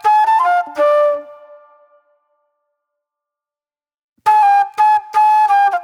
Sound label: mellow soul flute